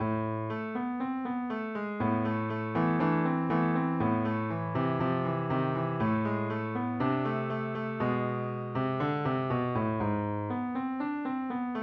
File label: tango; la cieguita; Lais